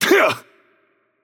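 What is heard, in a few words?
Attack Heavy, Portuguese; bloom minigame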